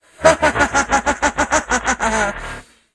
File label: laughs; Nemira; SyrinScape; Iris hill manor